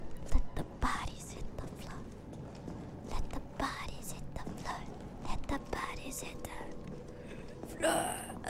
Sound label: Singing; IRySinging